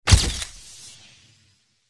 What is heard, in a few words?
Cameras Shot; Pest Shield